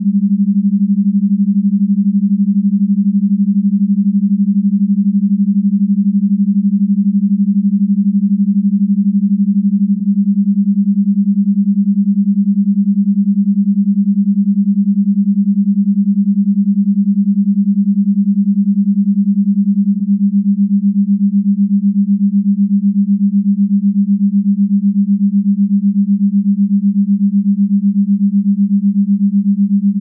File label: 12 10 8hz binaural; meditations